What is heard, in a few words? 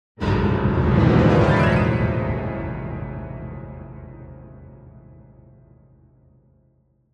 Piano Dramatic Rising Play; Hollywood Edge; sound designer's toolkit v2